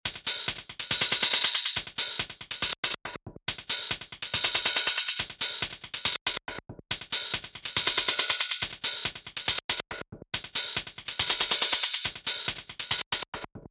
Hi Hat Rythm Filtered

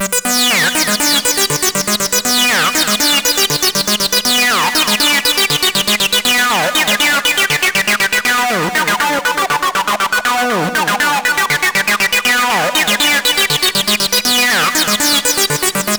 Minor Lead

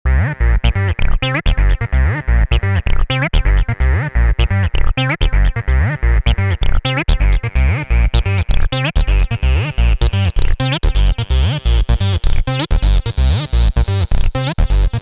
Candy Bass

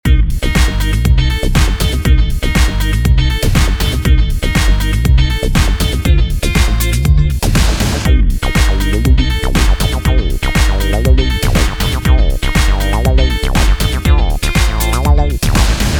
Disco Party Bass with drums

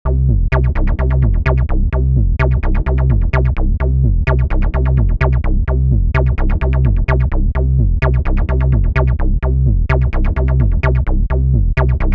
Sub Groove

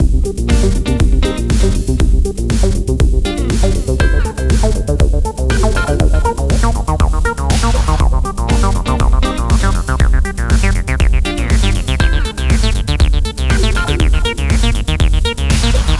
Back to disco with drums

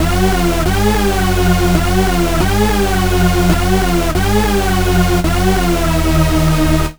Hoover Blaster

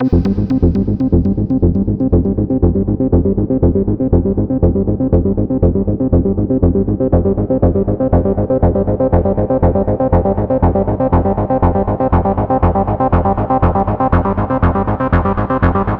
Joy Arp

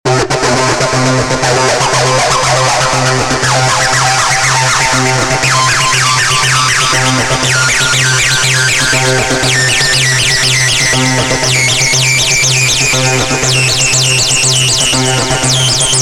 Hight Acid Note

